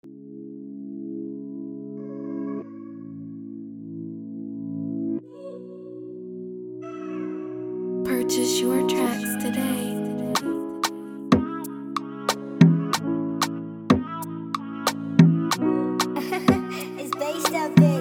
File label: Freebeat 93bpm E major